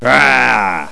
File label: GRR; Games.Carmageddon